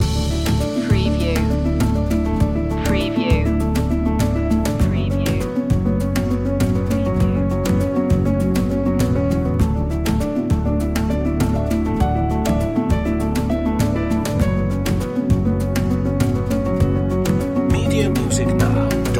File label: chasing piano loop